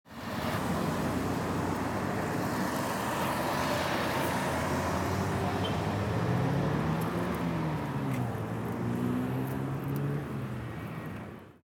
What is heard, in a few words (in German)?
Wasserwerfer vorbeifahrt